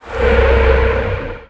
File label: mana tide totem Enter Play